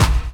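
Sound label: snare drum bass drum, Dance Mega Drums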